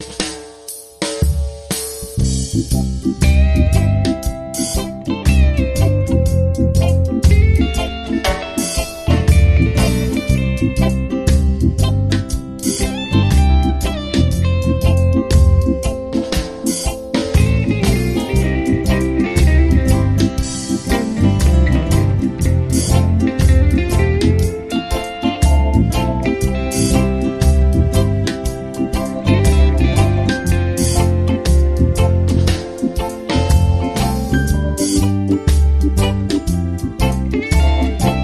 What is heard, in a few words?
Snorkel Reggae